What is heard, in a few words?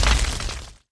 evil dragon hit; megaglest